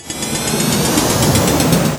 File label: e skill charge